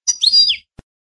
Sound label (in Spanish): chicolios; campero